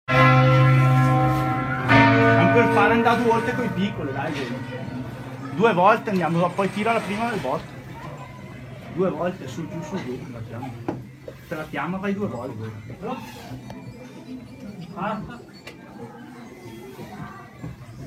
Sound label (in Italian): Bagolino campane